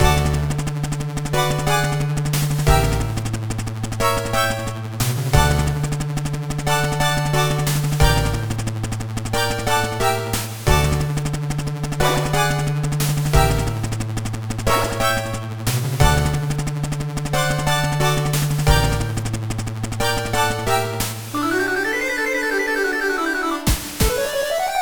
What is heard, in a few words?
Orchestral Chaos (8-Bit Version); rayman legends